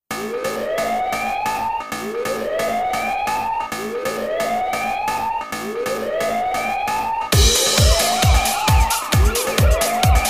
kungfu; DJMAX Ray; Android

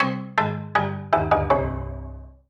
MUSIC EFFECT Solo Xylophone Negative; startide macos switch windows gamerip 2017

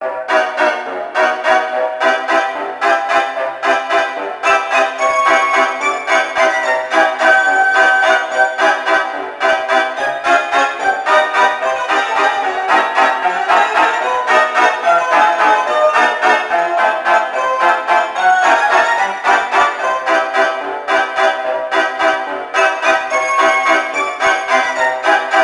Carnival Ambient; Twisted Metal Black

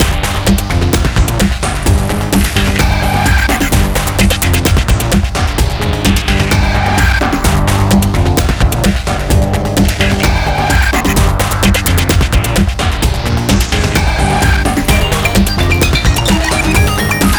Battle Loop; mad crown

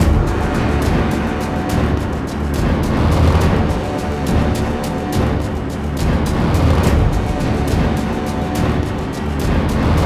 Combat Loop; Castlevania Lords of Shadow Mirror of Fate

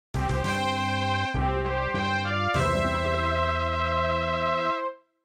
MIDI Fanfare; Deadly Sin

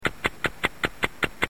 Clock appear; F.A.M.P.S

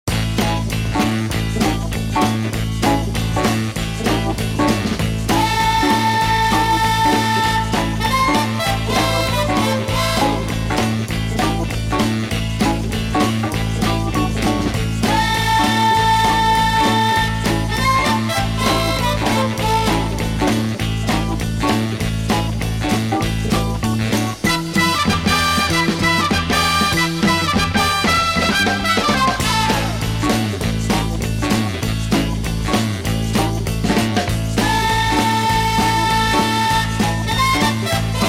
Beat Boutique; Joe Danger; ps3

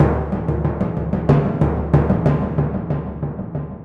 Timp

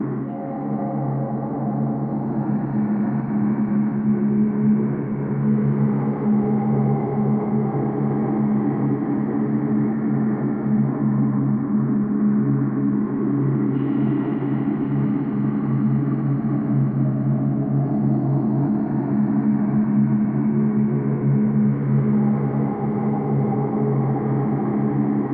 The Wrathful (Rabbit Ambience)

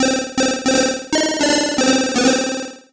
Solo Chip C64 Negative; MUSIC EFFECT; startide

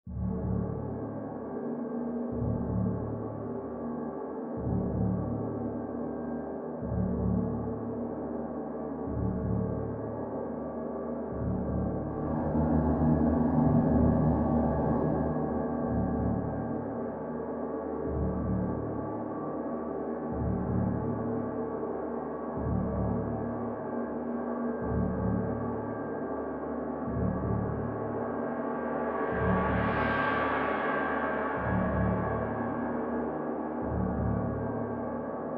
Ambient Suspense; Hdtf

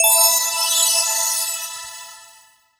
magic; Birthday Party Bash; Wii

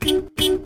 Horn; Dr. Driving 2; Android

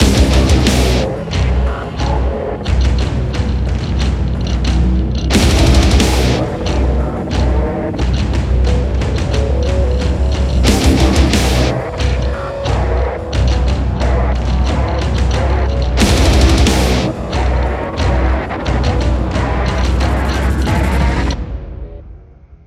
Music Song Intro; Hellbound

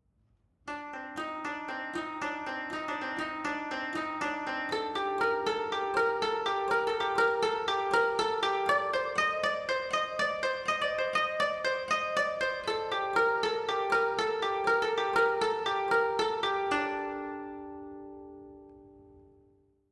Savannah Music

